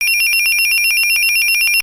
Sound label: Nextel Ringer